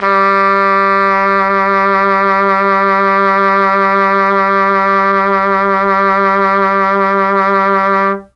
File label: G1 trumpet, mellotron; CHMB TRUMPET